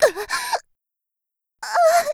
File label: die; Eternal Return; Tia; Voice Line; KOR